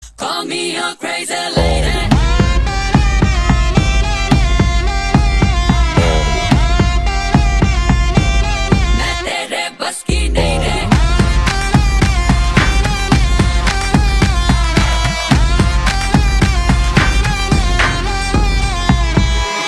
Ringtone With BGM Music

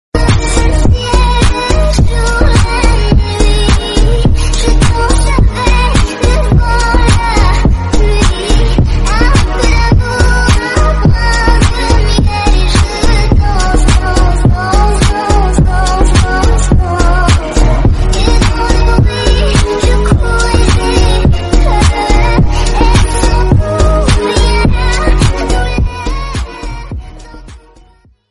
Ringtone Sad Version

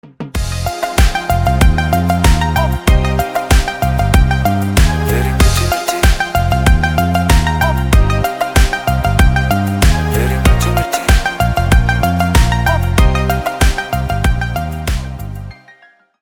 Instrumental Ringtone

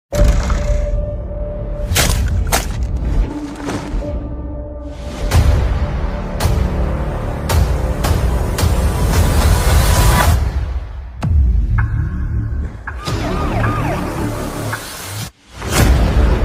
Trailer Bgm Ringtone; Drishyam 2

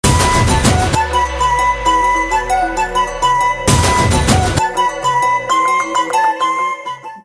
Instrumental Ringtone

